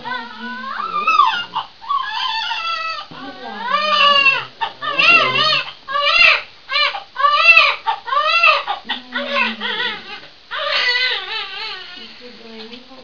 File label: baby